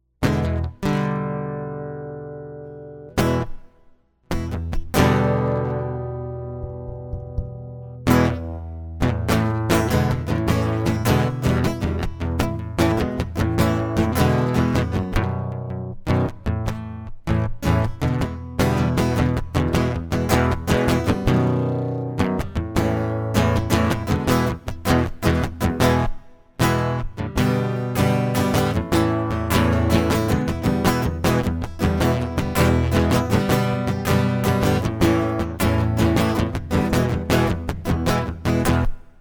acoustic

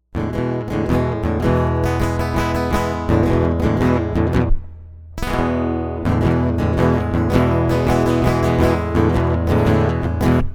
acoustic; MegaEpix

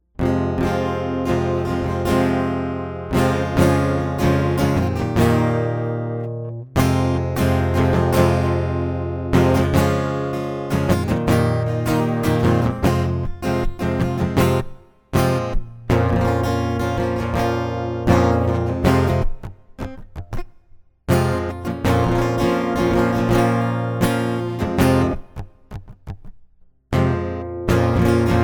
acoustic; MegaEpix

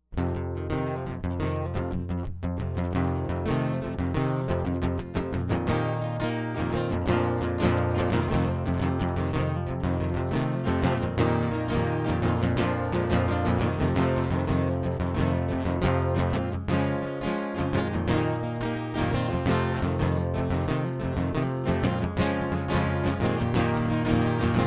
acoustic intro; MegaEpix Enormous

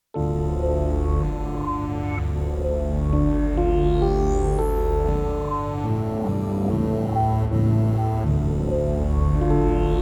space prelude

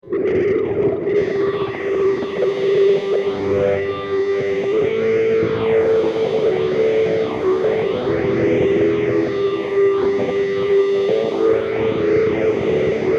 electric

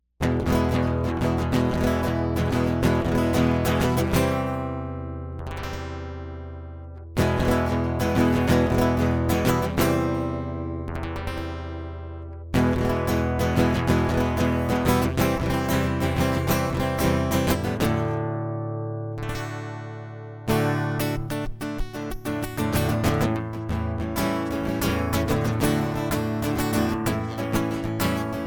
acoustic; MegaEpix

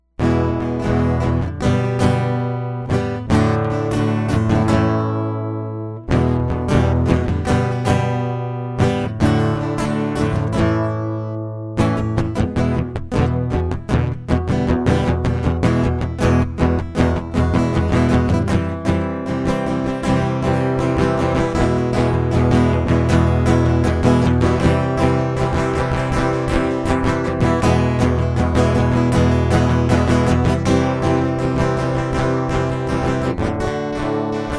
acoustic

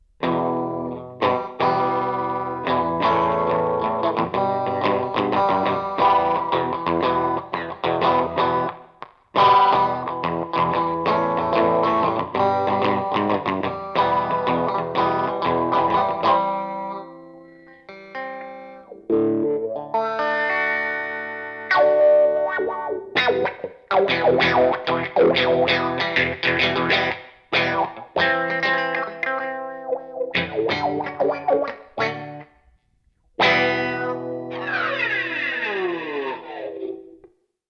electric

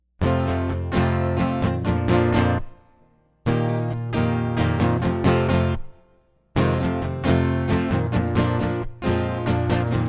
acoustic; Life

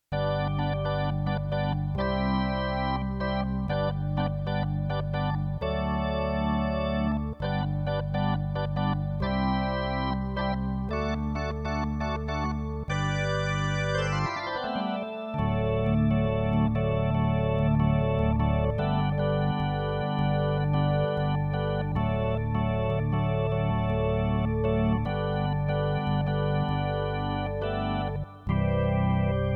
organ; MegaEpix

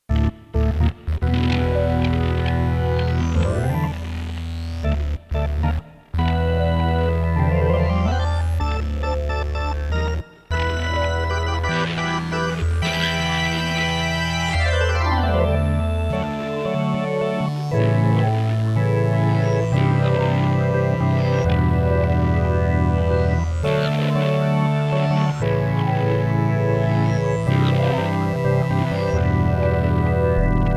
synthesized

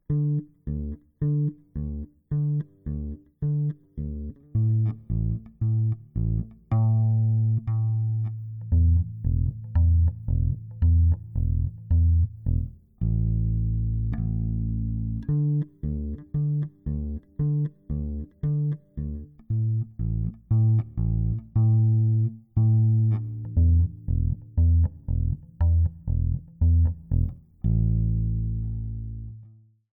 bass prelude

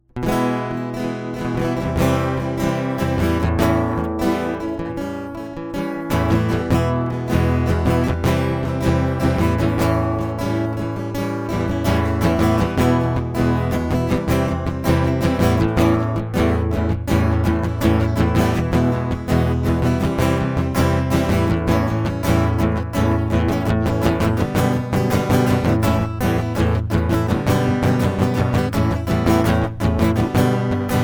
acoustic; MegaEpix

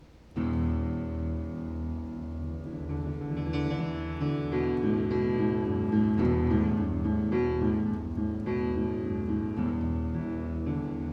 piano prelude; If This is Real

